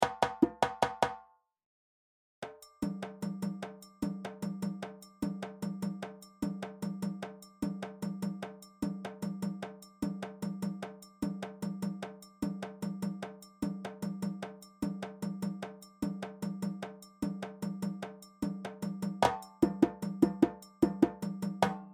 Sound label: Kenkeni + Djembe; Djembe 1